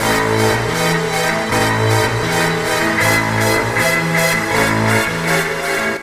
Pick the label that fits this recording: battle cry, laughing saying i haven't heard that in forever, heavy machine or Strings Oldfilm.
Strings Oldfilm